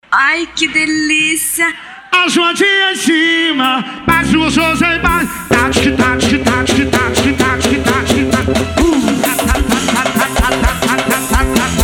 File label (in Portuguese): samba